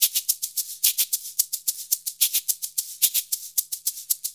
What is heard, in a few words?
110 MIX, Percussion Loops